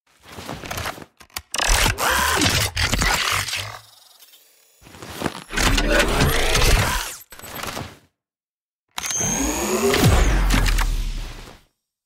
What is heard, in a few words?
Future weapons